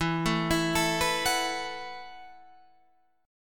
E Suspended 2nd Suspended 4th E, down, Guitar, Half Step Down